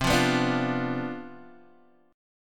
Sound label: C Major 7th Suspended 2nd Suspended 4th, C, down, Guitar, Open D